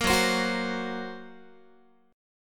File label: F sharp Major Flat 5th F sharp Guitar; F#Mb5 strummed